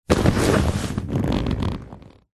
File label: household chair sofa couch leather sit down